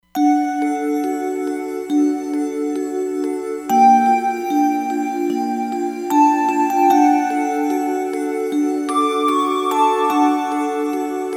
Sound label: Dreaming Box, Roland Fantom XR